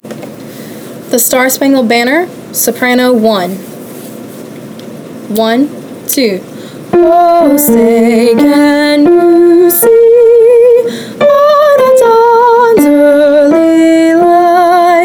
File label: the star spangled banner soprano